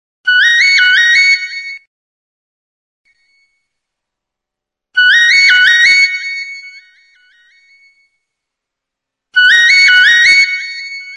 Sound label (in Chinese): nokia 单声; Nokia Lumia 1520内置铃声